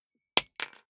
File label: key ring small drop parquet hard